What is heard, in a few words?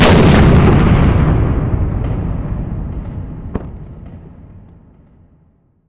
firewerks burst; atmosphere